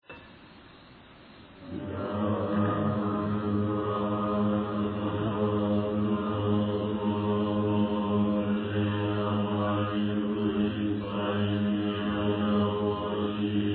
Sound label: end chant